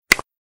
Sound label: Basic Button; slots; Crazy Rockets